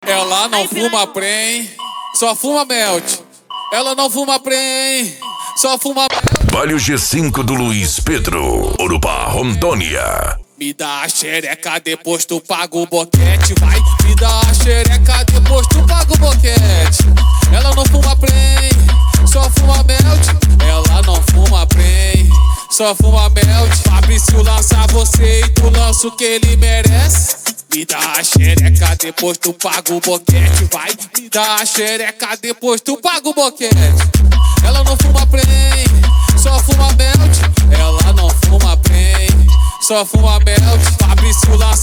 funk mega bass e forro; urupa ro

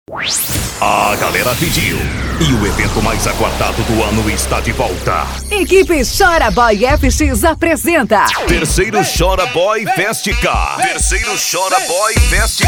sertanejo; fest car

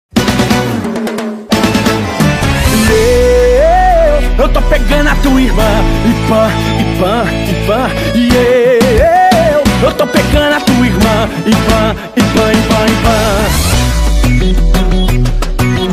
eletro funk

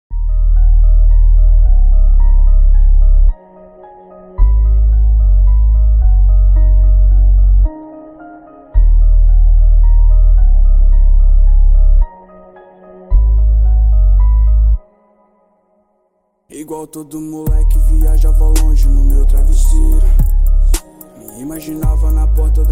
graves bass; segue o plano; blade h2

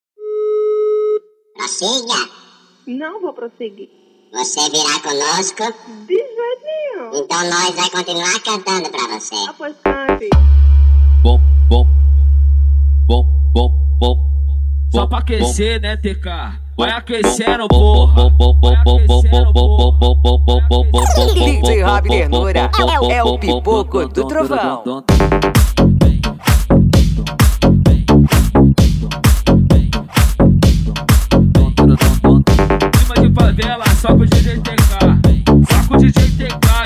beat do trote mega funk

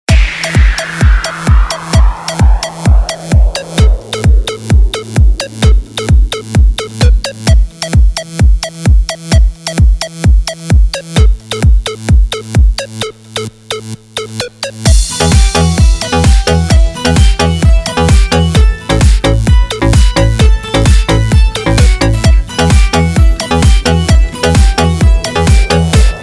eletrofunk

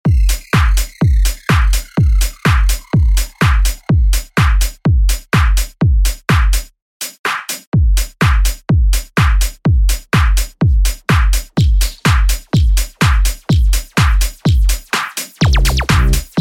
sertanejo remix

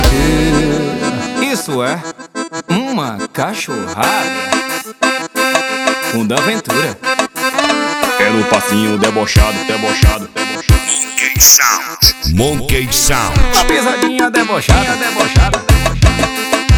esp balada

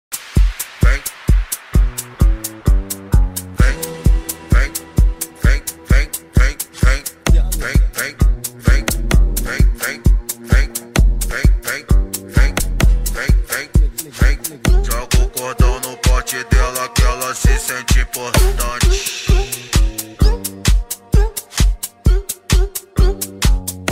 eletro funk; balada g4

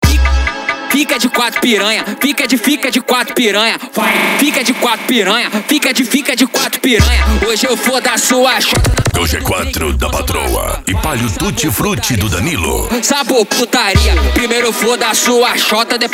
funk mega bass e flash back

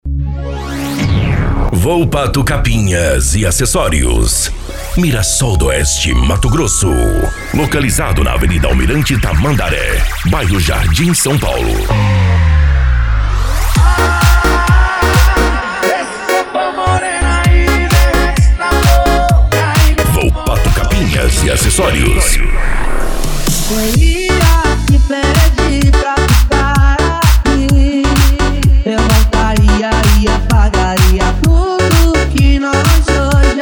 sertanejo remix; volpato capas e acessorios

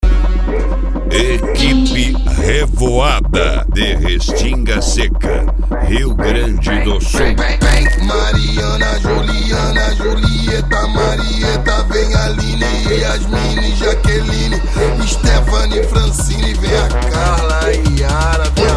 eletrofunk; cd equipe revoada especial fim de ano

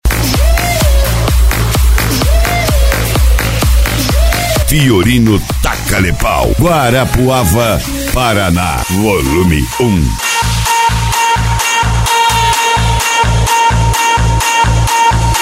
especial funk e electro house

